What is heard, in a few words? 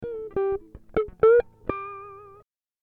Em 100 GTR Riffs